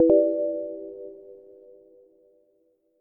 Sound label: double tap